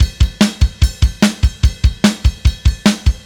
Beat, Indie Rock; Silos; Sonic Foundry (Sony Creative Software); Indie Rock Anthems; Session 11 - Silos

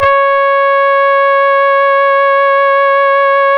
C#, Synclav FM, BRASS; Big Fish Audio

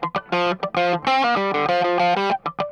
I INSIST, Funk Guitar; 90 s Sample CDs; Zero G

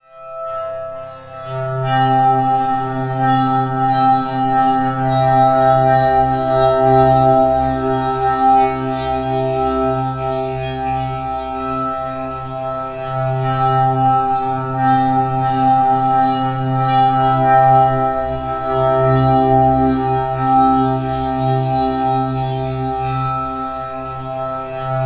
GLISS, BELL ATMOS; Zero G; Malice In Wonderland

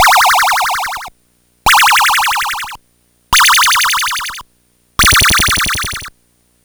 Game Boy Synth

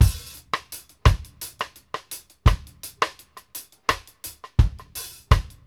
STICK, KD REGGAE 84; Sampleheads; New York City Drumworks VOL-1

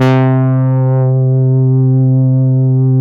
DW8 DS C3, Korg DW8000; Back In Time Records; Korg Universe VOL-1

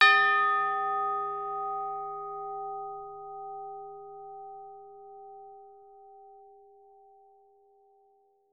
TBL LG D#5, orchestra; Best Service; Peter Siedlaczek's Advanced Orchestra

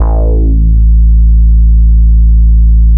FUZZ A0; E-MU Producer Series Vol. 6 – Analogia Project; Strictly Synthe

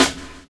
LAUGH; Univers Sons

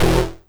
Synth Hit E; Trance; Synth Hits; Zero-G Trance Inducer; 90 s Sample CDs

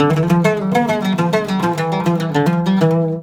World Lick

